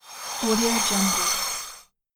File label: tune woosh